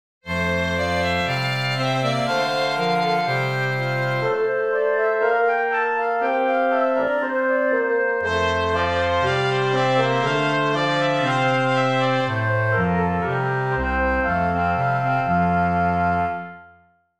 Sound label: Quartet Change